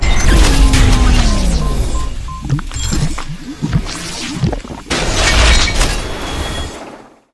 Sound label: robot boss, monster